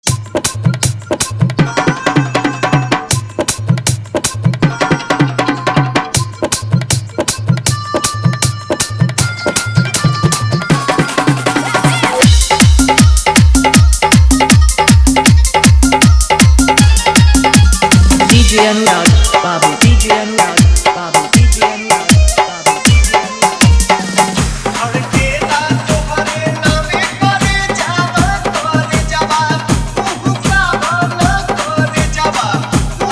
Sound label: Hard Dholki Bass Mix